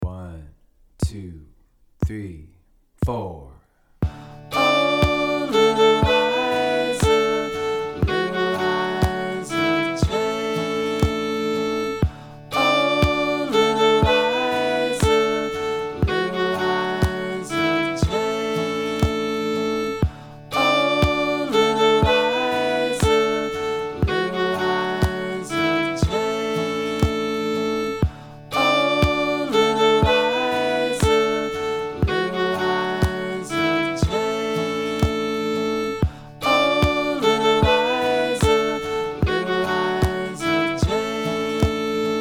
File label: Fiddle; Little Liza Jane; beginner fiddle lessons; the d major scale